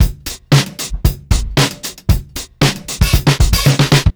115, Beat Loops; Zero-G; Monster Beats

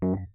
F#2, Rhodes Mark II 88 key hammered; CEPCo Sample Series Vol. 3

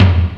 ROCK KIT

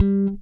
P-B SLAP G4, BASS; Greytsounds; JAZZ+P BASS

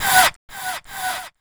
VOICE FX, Dance Vocals

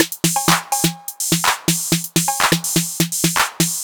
drum loop eight top 125, Classic Chicago House; Sample Magic; Drum Loops

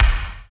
BD, Dance

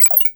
guiro, synth guiros; Zero-G Ambient 1

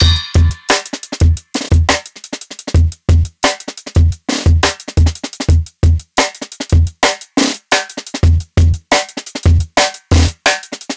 Live Jungle Drum Break, 175; JungleBreaks24

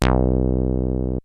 BUZZBASS 303; Rubber Chicken Software Sample Bank; DRUMS + PERC

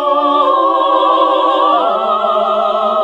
SING, CLASSIC VOX; Best Service; Hallelujah

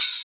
Open Hhat, Hihat; Equipped Music; Loungin House

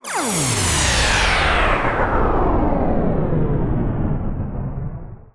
Synth Sting; musicradar

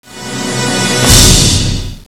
SWELL HIT; Kid Nepro - Elite

